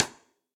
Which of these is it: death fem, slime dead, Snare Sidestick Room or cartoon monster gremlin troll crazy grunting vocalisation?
Snare Sidestick Room